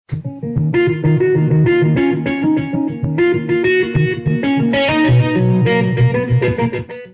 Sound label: Electric Guitar